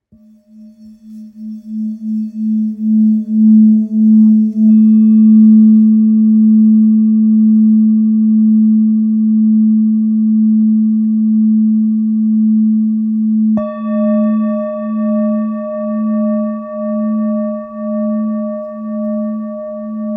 Bol therapeute la

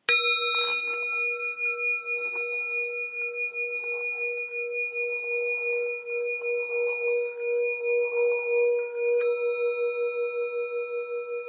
Bol bengale 3-400 rediese

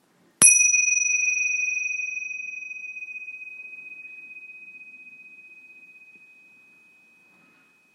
Cymbales 7cm mantras

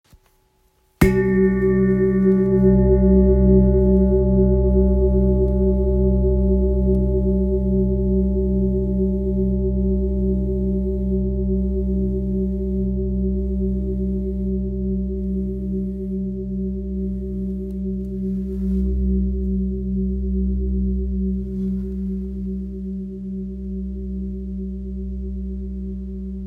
Gros bol grave sol 8.25kg; tara verte

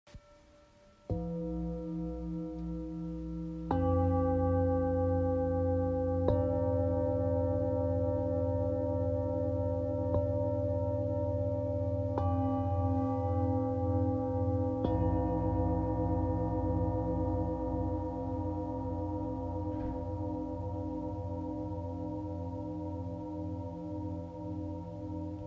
Set 3 bols therapeutes

